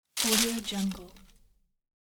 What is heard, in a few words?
paper crumpled